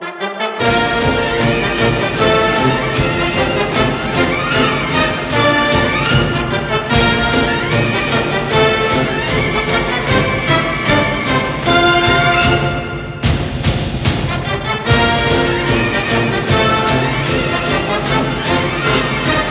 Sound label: Broadcast Fight Song